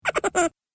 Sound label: Cartoon Gremlin Chuckle; Cartoon Voice; Cartoon Voice Sound Effects